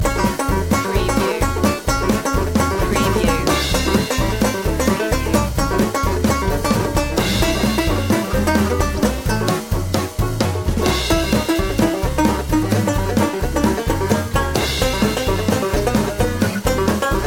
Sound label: swing loop